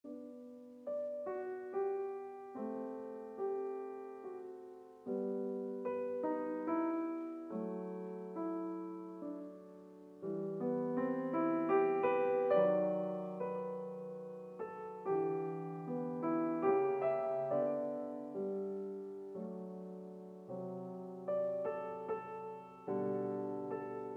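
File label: piano waltz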